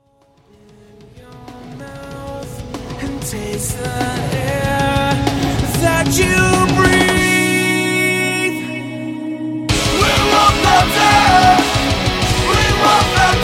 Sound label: singing ghosts